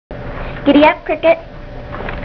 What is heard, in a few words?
cricket